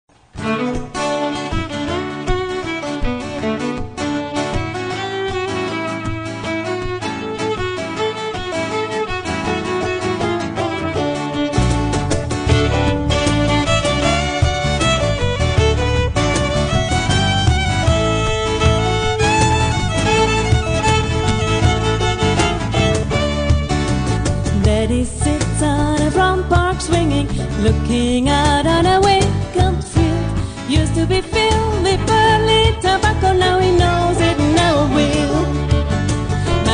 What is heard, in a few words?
Cello